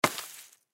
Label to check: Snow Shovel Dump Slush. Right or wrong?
Right